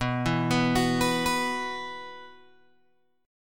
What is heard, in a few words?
B Suspended 4th, Guitar, Open G